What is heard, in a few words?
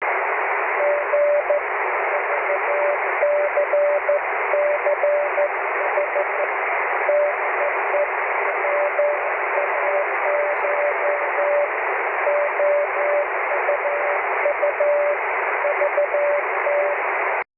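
continuous wave, 23cm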